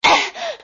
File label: Cough; Lewd SFX